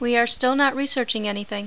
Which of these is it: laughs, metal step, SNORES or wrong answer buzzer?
SNORES